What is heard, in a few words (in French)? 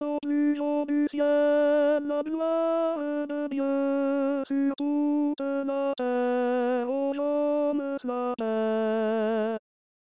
tenor; au plus haut du ciel